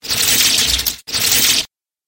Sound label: monster bat